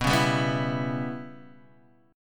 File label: C sharp Minor 7th Flat 5th B